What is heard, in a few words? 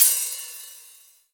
OH; Riddim; One-Shots; Hats and Cymbals; Ghosthack; Riddim Essentials Volume 2